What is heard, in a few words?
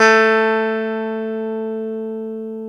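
guitar electric 12 string; Club-50 - Foundations Roland; E.12 String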